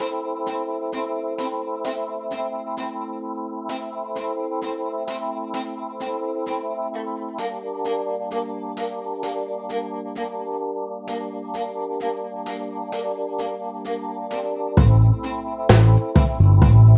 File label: Dark Sax; Melodoser